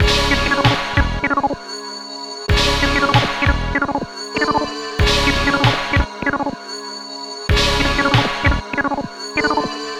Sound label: Melody, Hip-Hop; Sonic Foundry (Sony Creative Software); Lukecage Underground Soundlab Hip-Hop